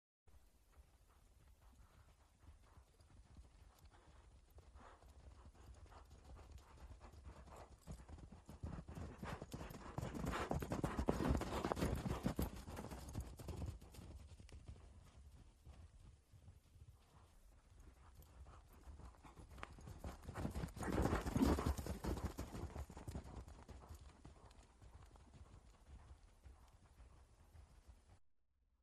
FOOTSTEPS SNOW RIGHT TO LEFT LEFT TO RIGHT TWICE; wildlife sounds

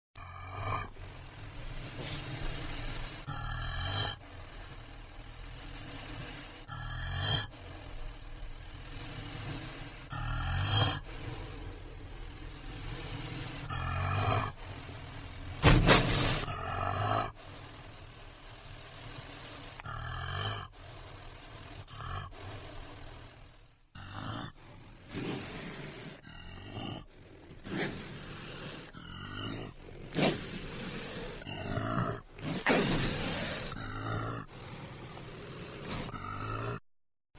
BADGER GROWL HISS; wildlife sounds

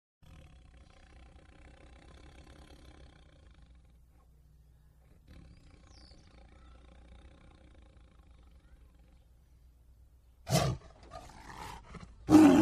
TIGER GROWLS MED DISTANT LOW GROWLS FIERCE SNARLS; wildlife sounds

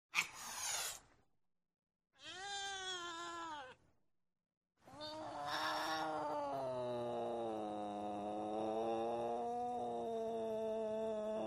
DOMESTIC 8 ANGRY SNARLS HISSES; wildlife sounds

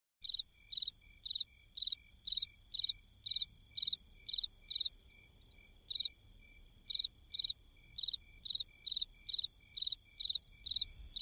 FIELD CRICKETS; wildlife sounds

